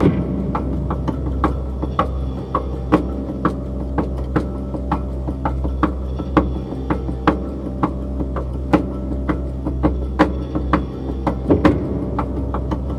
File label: Music Encounter High Pulse Piano Knock; Battlefield 4